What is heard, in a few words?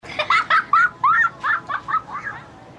laughing